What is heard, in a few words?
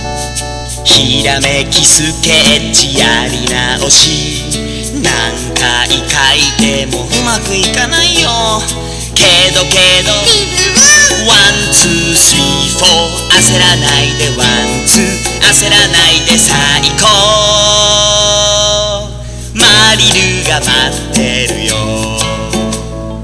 sing